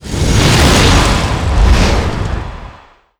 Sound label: death; physics; deaths; specials; lina